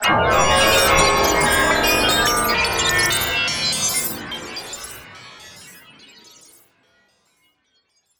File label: fx loose pieces; One Shot FX; Sample Magic; Transmission-X